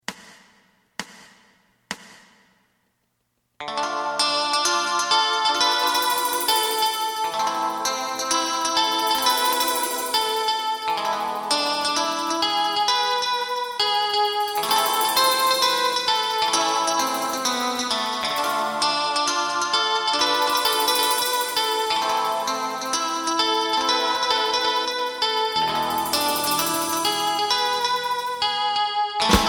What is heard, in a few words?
MUSTANGS TWO GUITARS